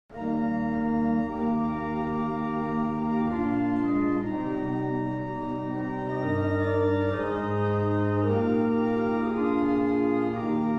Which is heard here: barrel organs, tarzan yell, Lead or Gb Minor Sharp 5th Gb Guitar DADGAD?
barrel organs